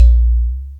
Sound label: Udu, dry perc, Quasimidi Rave-o-lution 309